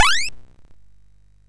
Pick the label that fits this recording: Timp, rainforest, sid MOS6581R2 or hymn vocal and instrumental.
sid MOS6581R2